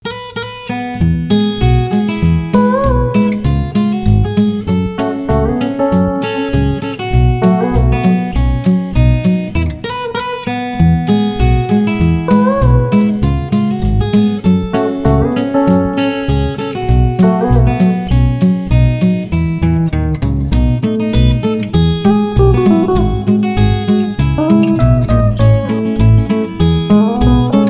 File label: Instrumental